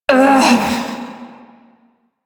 Struggle Short; New War

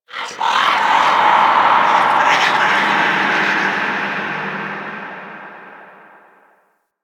Moon Whispers Medium, Ambience; Warframe; Orokin Moon

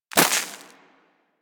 Bullet Impact Debris Snow

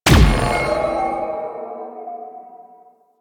Overguard Pulse Repeat B; Warframe; Infested; Ancient; Protector Ancient

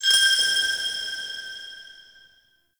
Vendor Crystal Coin; Ambience; Soul Plane

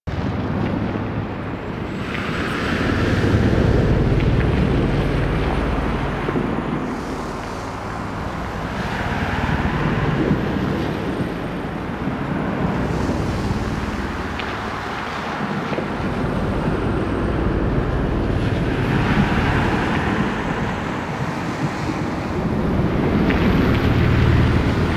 Moon Exterior Ambience; Warframe; Orokin Moon

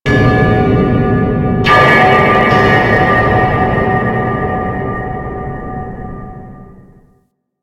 Pipe Drop; Ambience; Warframe; Grineer Galleon

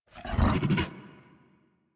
idle alert turn, Kubrow; Enemies; Warframe; Tame

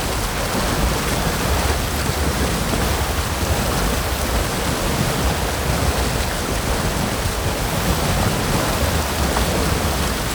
Water Jet Platform Loop, Waterfall; Ambience

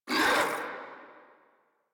Infested Charger Pain; Warframe

